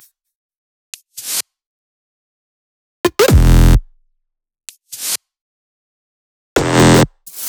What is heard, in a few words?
128BPM Melody; Vengeance Samples; VFH1 128BPM Southern Kit